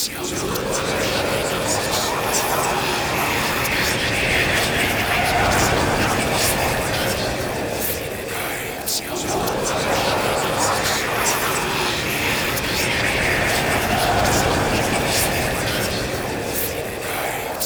Possessed Red Veil B Seek Wave Loop, Enemies; Warframe; Priest Quest

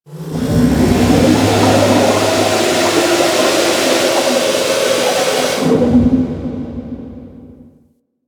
Ocean Steam Blast Large; Ambience; Warframe; Grineer Ocean

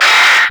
Screen Flicker; Warframe; Duviri; Easter Eggs

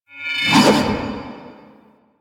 Sword Melee Heavy Charge; Enemies; Weapons; DE; Warframe; Teshin

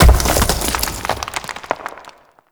Large Rock Crumble D; Ambience; Warframe; Grineer

